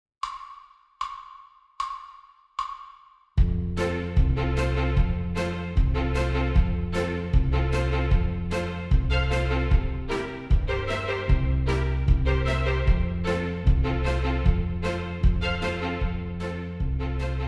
Horns in Fifths

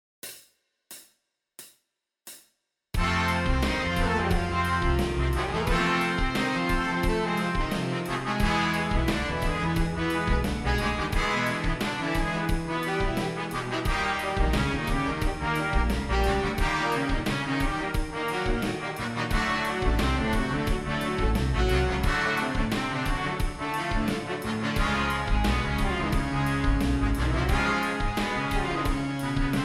Intermediate Daily Warm up Horns in Fifths

